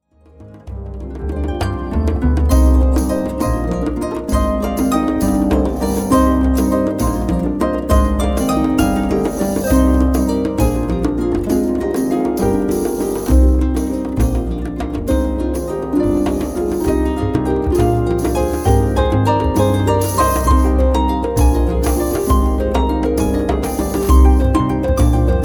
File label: Baroque Flamenco, harp